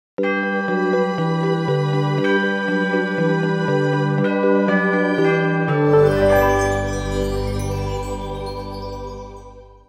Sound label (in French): Noel jingle; Jingles medley